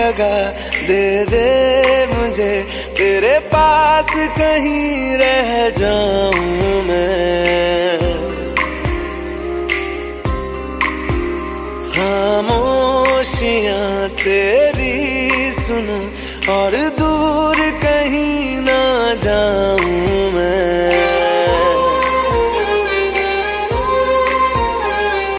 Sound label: bollywood music ringtone; english ringtones